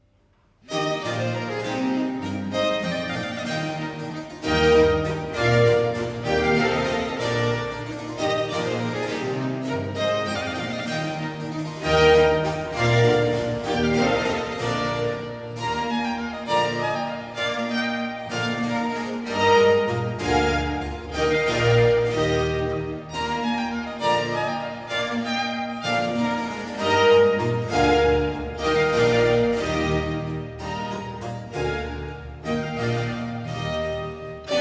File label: Concerto 9 in F major 6. Minuetto, vivace; Arcangelo Corelli; Corelli - Complete Works; Klassika